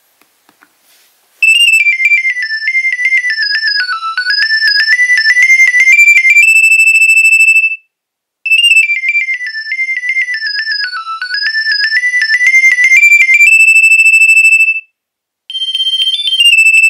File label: Nokia 2300 Ringtones